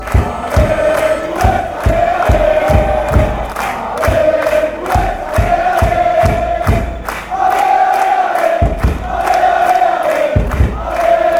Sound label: ale ale, fan chants; hercules